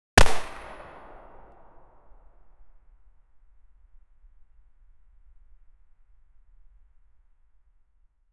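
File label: extremely dry mp5 9mm single; gunshot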